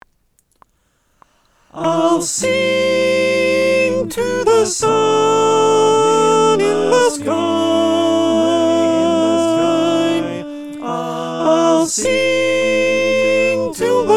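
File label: soprano, choir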